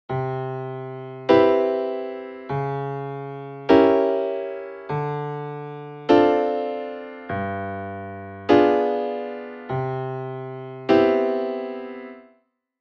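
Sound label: basic C major jazz chord progression; intro jazz blues lessons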